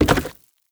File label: debris cardboard, bullet impact debris